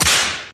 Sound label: Whip Crack; Beat Saber Miss Sounds